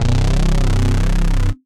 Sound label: Red Alert, Analog Bass; Ableton; Factory Packs; Retro Synths; Analog Bass Vol 1